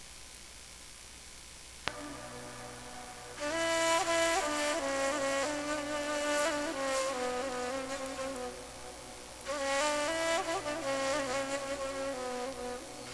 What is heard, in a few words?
scratch